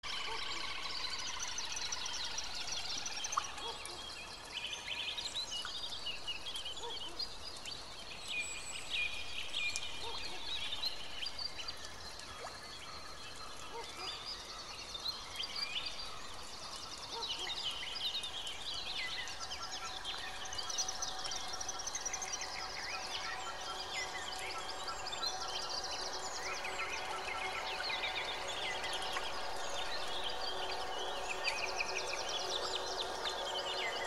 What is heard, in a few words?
Most relaxing Music ever Rain Forest Meditat